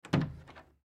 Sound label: Door Wood Panel, Door Wood Panel Open; Household; Miscellaneous Doors